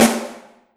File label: Yamaha RM50 Snare Drum